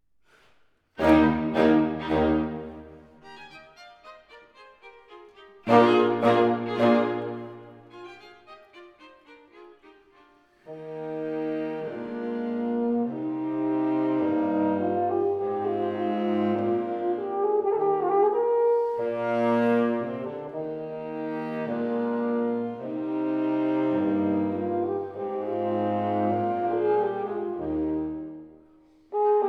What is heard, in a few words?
Horn Quintett Allegro